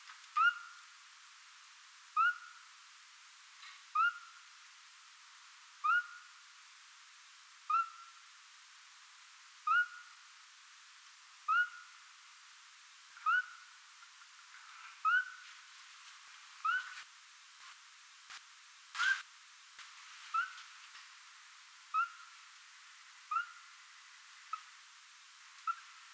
call, scops owl; vocalizes with a soft brief "bip"; aged five months; otus scops

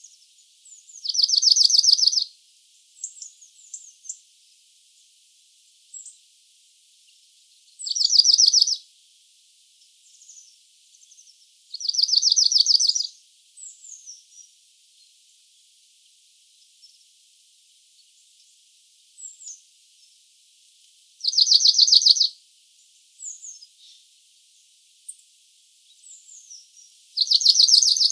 call, cirl bunting, Emberiza cirlus; made with an automatic unattended recorder

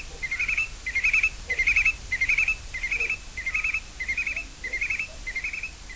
call, Stone curlew; Burhinus oedicnemus

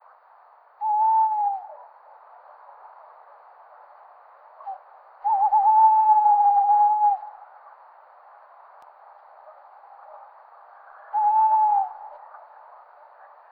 call, tawny owl, Strix aluco; Average interval between calls: 13.8 s

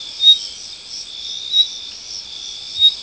call, Nightingale, alarm call; Voltina pond near Grosseto; ALTITUDE: +0 m.; luscinia megarhynchos

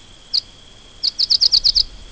call, cirl bunting, Emberiza cirlus; Poderone (3 birds)

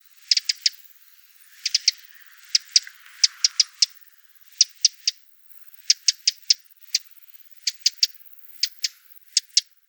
call, wren, alarm/anxious call; Mount Labbro; ALTITUDE: +1000 m.; troglodytes troglodytes; LAT. N. 42 48'/LONG. E 11 33'

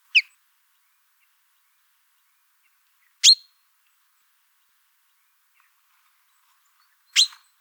call, chaffinch; The other two calls (chink calls) are uttered generally by perched birds; Fringilla coelebs

